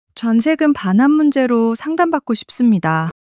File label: struggle